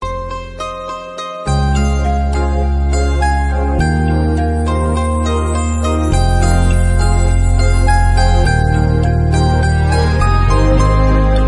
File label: instrumental